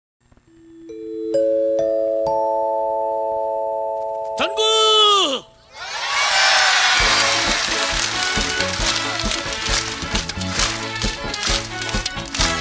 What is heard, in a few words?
ringtone